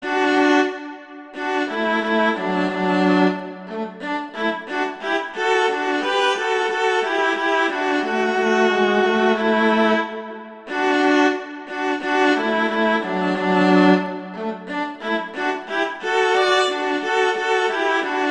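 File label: Duet, horn; Mozart; Duets; Vla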